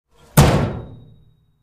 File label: Cabinet Door Slam